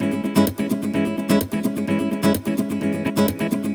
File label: Nylon Guitar C# min, 128BPM; Vengeance Samples; VEH3 Nylon Guitar Kit 1